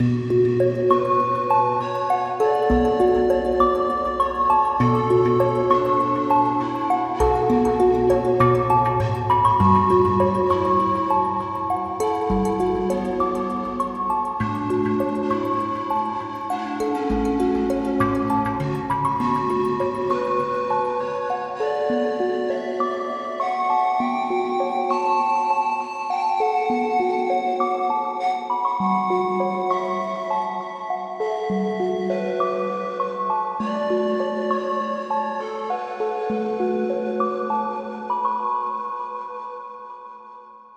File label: outro oneshot 100bpm; boss5